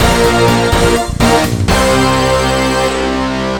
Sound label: Level Completed; Megacity Empire New York; Android